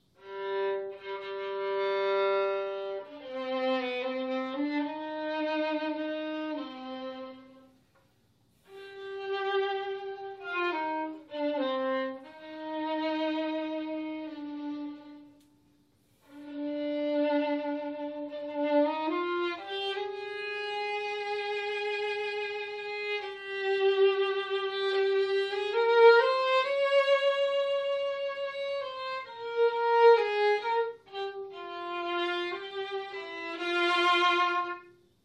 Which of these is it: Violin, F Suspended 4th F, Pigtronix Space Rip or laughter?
Violin